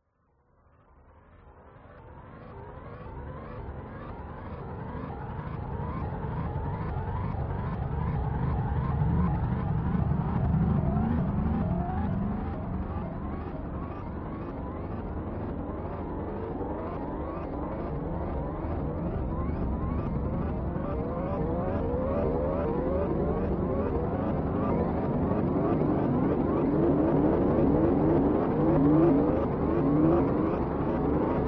sound design texture glitchy sci fi alarm like constant; scott lawlor